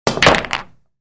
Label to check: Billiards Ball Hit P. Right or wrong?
Right